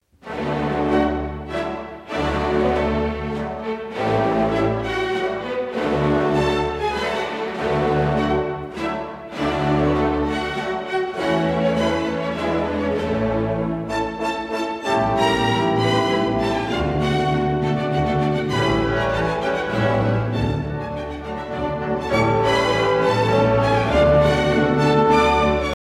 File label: Esmeralda Male Variation